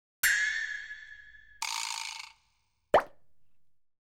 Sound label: Twang, Razz, Pop; BBC Sound Effects Library